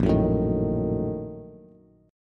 Bb Major 7th Suspended 4th Sharp 5th, Bass; 6,9,7,8; strummed

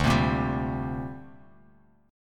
D 7th Suspended 4th Sharp 5th down Bass Standard; D7sus4#5 strummed

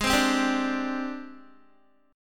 G sharp Major 7th Flat 5th, Guitar; strummed; chord; 15,15,12,12,14,x

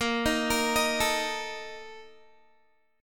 A sharp Major 7th Suspended 4th, Guitar

